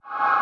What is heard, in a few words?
ambience